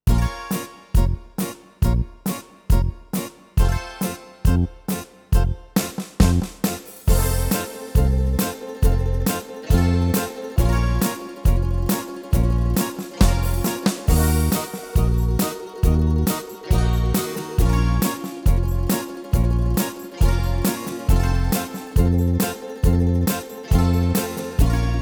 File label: style world sirtaki; yamaha tyros3